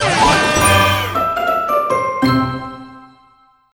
Crunchy Finish

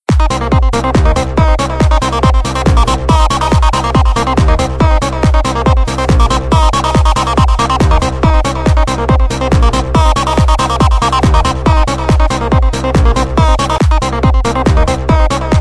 FUNKY BASE; Radical Aces; online gamerip 2003

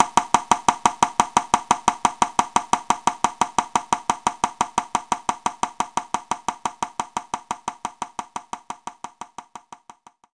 hi conga

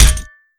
grenade bounce metal

